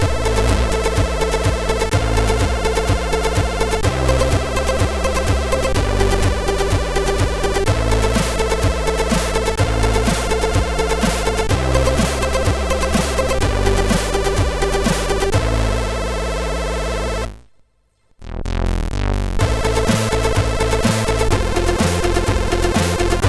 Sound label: Awake to Die, sid MOS6581R3